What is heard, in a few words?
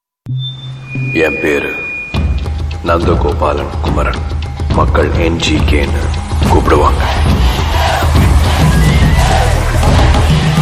teaser ringtone; tamil; suriya